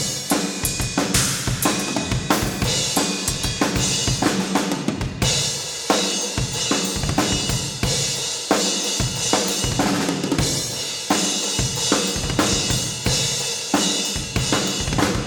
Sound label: room